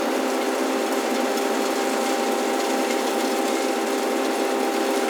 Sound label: silo, ambient; nuke